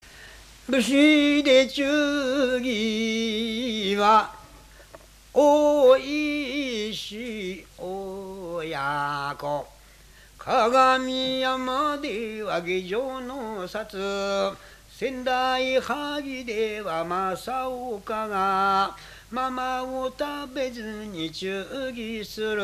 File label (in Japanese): sendaibusi, minyou